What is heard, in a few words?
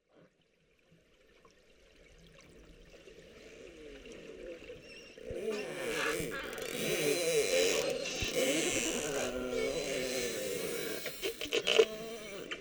beluga